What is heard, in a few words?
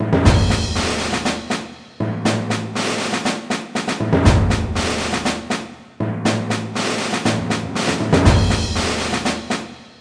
March Normal